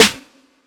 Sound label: Snares